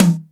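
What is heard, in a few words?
Acoustic hi tom, Roland MC-303 Toms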